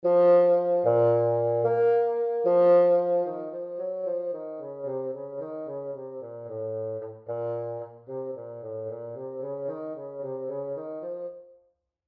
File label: Bass Clef Example